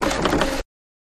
Dot Matrix Printer Form Feed, Short With Carriage Reset